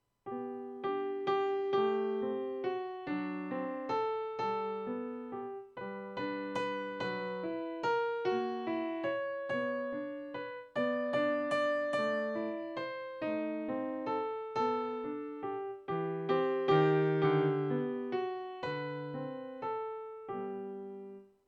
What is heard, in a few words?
Romance